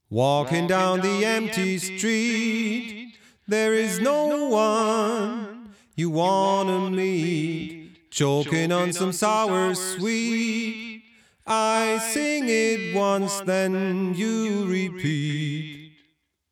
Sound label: Echorec Vocal Delay; T-REX Binson